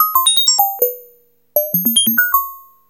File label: RANDOM BELLS; ANALOG BELLS; Ambient; Zero-G; Ambient 1